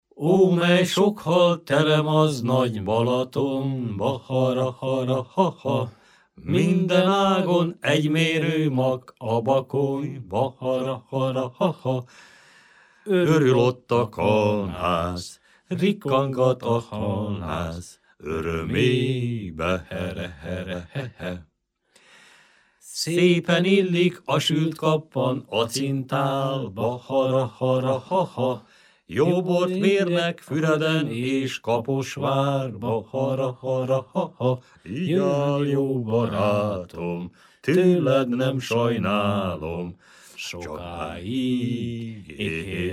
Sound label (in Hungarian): nepdal; ó mely sok hal terem a nagy Balatonba